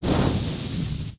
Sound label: Flame Thrower Start